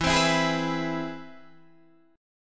C Augmented Major 7th E

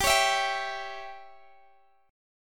C sharp Diminished

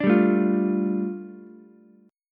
A 7th Suspended 4th Sharp 5th, A, down, Ukulele; A7sus4#5 strummed

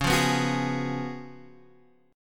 Db 11th Db, down, Guitar Standard; strummed; JGuitar

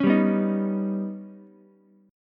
Eb Augmented 7th Eb down Ukulele; Eb+7 strummed; JGuitar

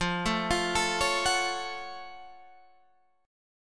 Gb Minor Major 7th F

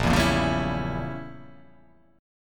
A sharp Diminished 7th, chord

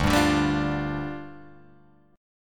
D Minor Double Flat 5th, down, Guitar, Open G

